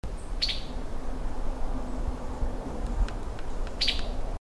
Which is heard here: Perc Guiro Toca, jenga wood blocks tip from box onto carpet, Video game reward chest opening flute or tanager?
tanager